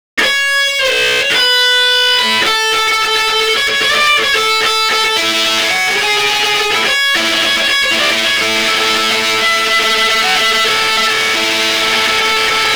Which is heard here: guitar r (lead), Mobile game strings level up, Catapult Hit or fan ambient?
guitar r (lead)